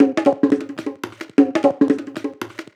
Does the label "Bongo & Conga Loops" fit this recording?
Yes